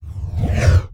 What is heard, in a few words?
Bright Burst